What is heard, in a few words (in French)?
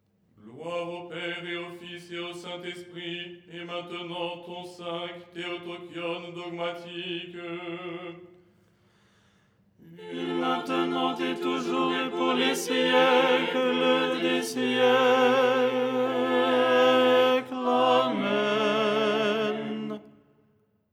tenor; " Gloire… et maintenant… "; Les 8 tons en français